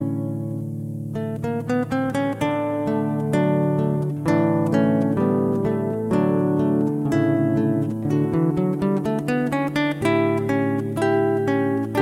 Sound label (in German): sonate fuer floete und gitarre in d dur romanze; christian g scheidler